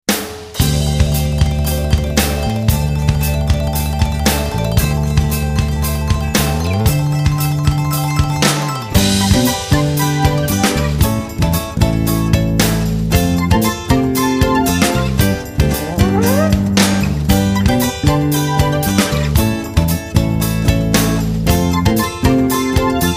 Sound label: jingle; medifant